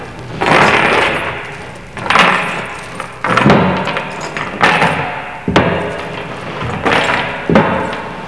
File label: CHAINS; spook